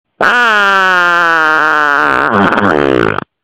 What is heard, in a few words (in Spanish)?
Pedo casa